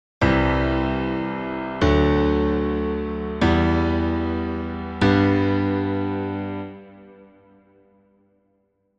piano

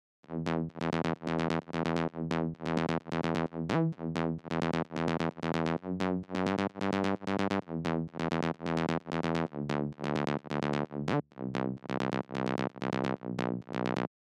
mid bass

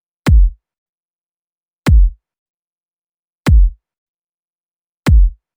Kick EQ

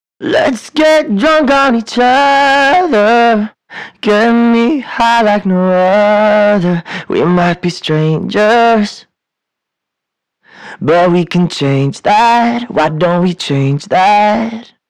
vocal wet vintagize

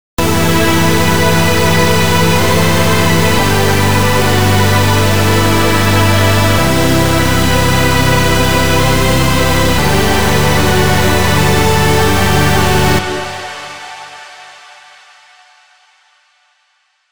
Supersaws Full

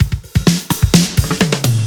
fill

